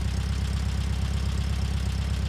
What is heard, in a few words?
Truck idle; zps; Ambient; Vehicles